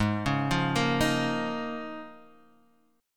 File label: C sharp 6th Add 9th G sharp